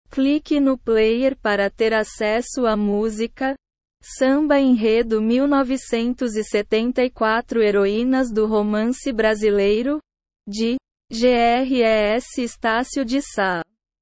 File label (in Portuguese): samba enredo